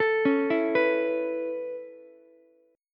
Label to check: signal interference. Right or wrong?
Wrong